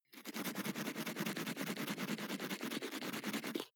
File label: teeth brush dry closed molars fast